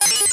science fiction short robot beep

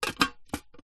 foley metal money tin lid place on; zapsplat

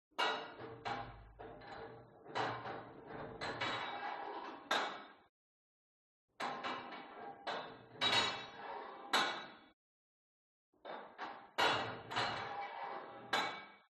GYM Put Weight Onto Barbell Unsteady Reverby; SPORT

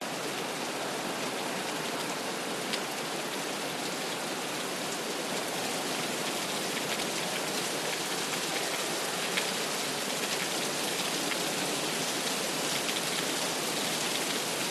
RAIN Exterior Street Heavy Pavement Steady

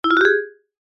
cartoon vibraphone short ascend; zapsplat

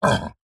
horror monster short grunt

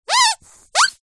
leisure toy rubber duck squeeze squeak

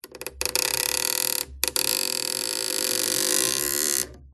Stress Creak Squeak Groan; PMSFX

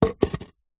foley concrete block set down on top of another; zapsplat

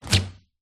household refrigerator door open; recorded by seal

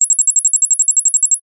multimedia game points tally

